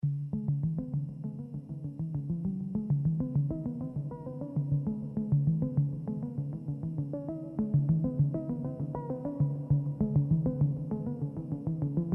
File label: noise – 16 January, 2022 – Ground Zero Nightclub